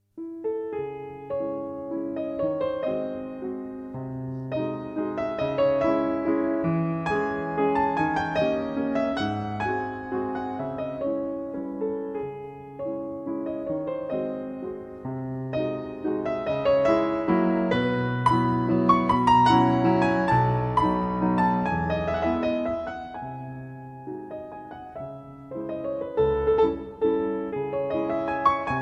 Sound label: Mazurkas in C Sharp Major Allegretto; Mazurkas Op. 63 No. 3